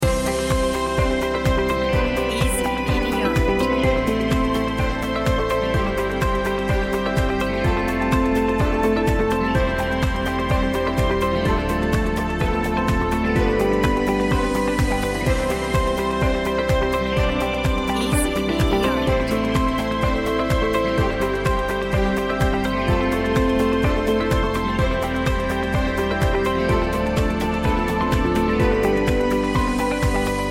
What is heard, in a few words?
light motivational corporate loop